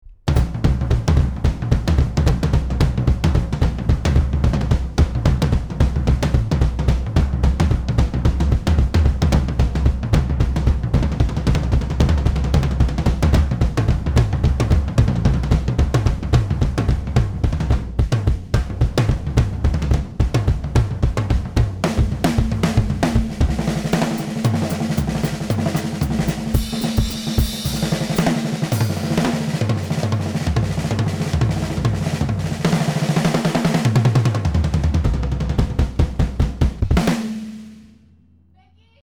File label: tomtom